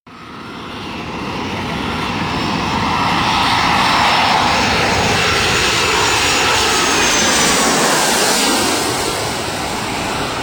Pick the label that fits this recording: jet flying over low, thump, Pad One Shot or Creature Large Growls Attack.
jet flying over low